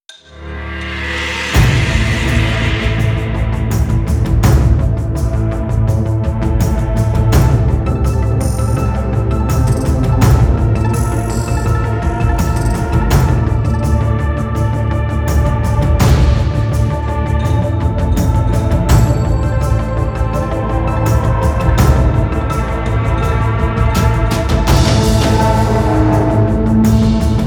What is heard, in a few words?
tensionproject violin traps; By Burak Sound Bank; tension gerilim vol 13